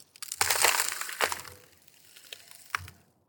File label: FROZEN FARMLAND Ice Crack thin ice squishy; Frozen Farmlands; Eneas Mentzel